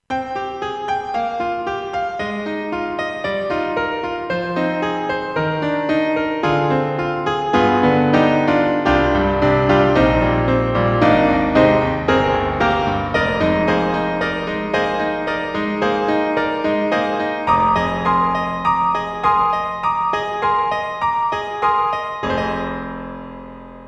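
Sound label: Roland SRX-06 Classical Piano 1, XV-5050; SR-JV80-02 Orchestral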